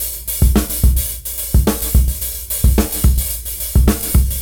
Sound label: Free Latin 105 BPM; AMG - Keith LeBlanc Phat Butt Jams